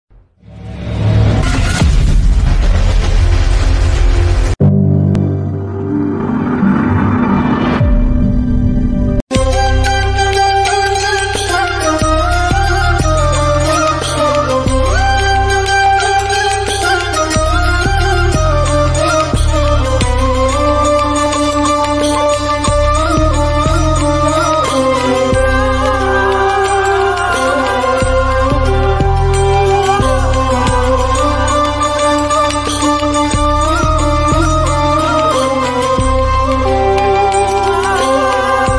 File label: love bgm ringtone song bgm ringtone; ngk movie